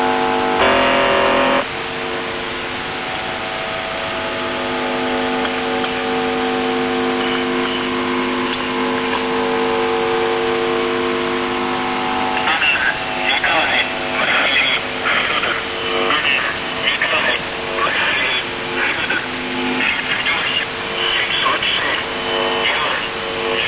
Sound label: buzzer usb msg